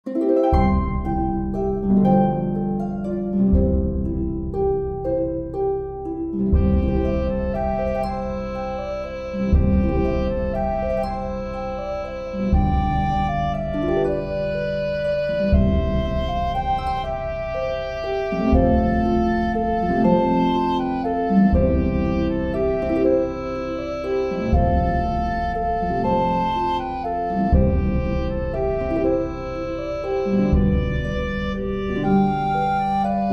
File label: Oboe track with Melody; Silent Night